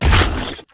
thief

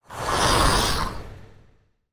4 leg skeleton, monster

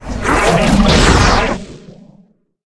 attack

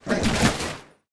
damage, monster

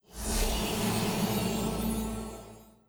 pillar gate main, monster

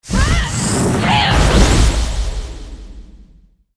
vampire attack, monster; rigel

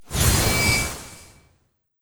Stellar Explosion

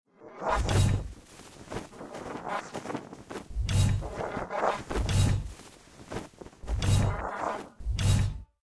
guardian idle, monster; legacy guardian

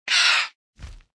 blade peryton damage, monster

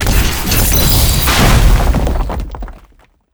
boss dreadnought satk; steamer crazy

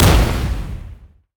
mech naga explo; monster

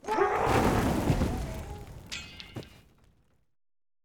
servant gargoyle death

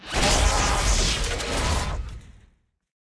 dead, monster

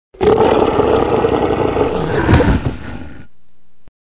moth death, monster

